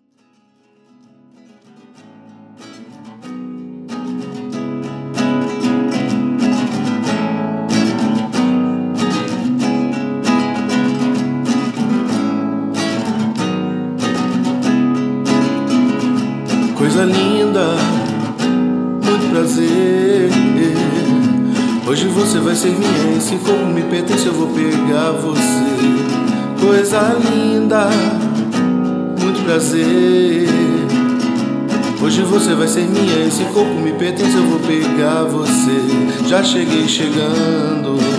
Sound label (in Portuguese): samba